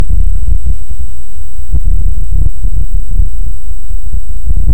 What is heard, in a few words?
Hydrophone; OneOcean